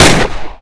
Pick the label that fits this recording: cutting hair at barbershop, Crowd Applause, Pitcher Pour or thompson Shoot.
thompson Shoot